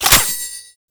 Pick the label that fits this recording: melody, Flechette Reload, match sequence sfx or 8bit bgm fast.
Flechette Reload